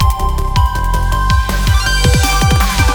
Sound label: Snow Transition; sky rogue